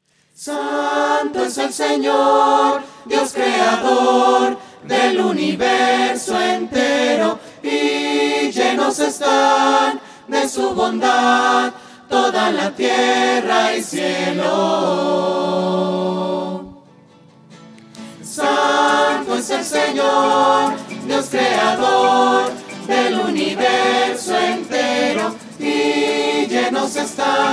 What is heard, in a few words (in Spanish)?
santo fresa voces; misa